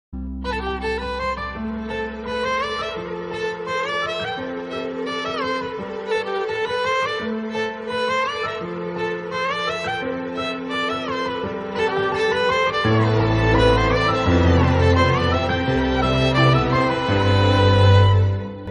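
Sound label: love background music ringtone; remo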